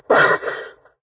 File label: redout short; War Thunder